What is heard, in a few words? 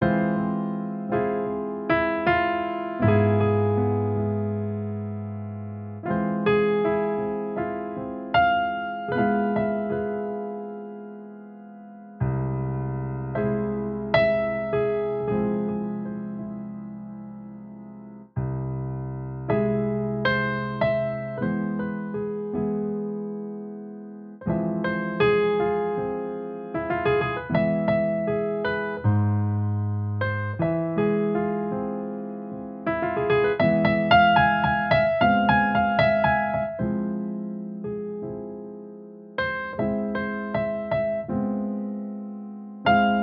House